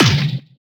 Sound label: big Fall; HeavenStudio; fillbots